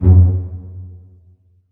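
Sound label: pizzicato F#1 mezzo forte left, string; Sound On Sound - Peter Siedleczek's Orchestra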